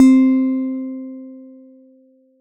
LED C4, Yamaha TX7 synth keys

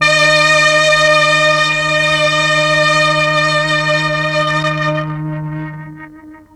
MEL STR, Legendary M400

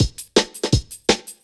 Cut, breaks

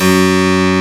HARPSICH C

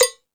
Sluggo Drums, Drum Machines

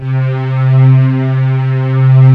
PAD STRINGS; Pro-Rec - Synthsonic

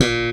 PULL A#2, Bass; Sampleheads; Will Lee Bass Library; SPECTOR PULL

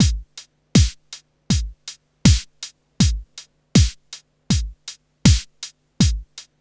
HIPHOP; INIS; Funky Xtreams

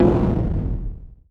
VZWD THUND

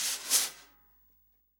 SWING SNARE CHROMATIC, Real Drum Kit; REAL DRUMS

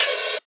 Open HH, Cymbals

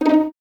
Violins D#4 Pizz, String Ensembles; Miroslav Vitous; 11 Violins

